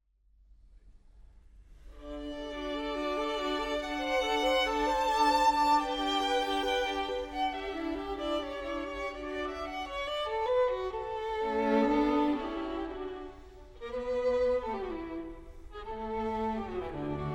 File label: String Quartet; Wolfgang Amadeus Mozart; Mozart String Quartets